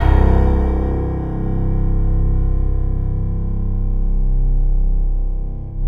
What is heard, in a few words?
Piano C1